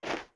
Steps Snow

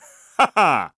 Laugh; Gondo laughing; zelda sounds; Skyward Sword

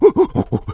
Torch Run

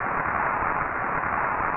Rain In; Zelda; LTTP

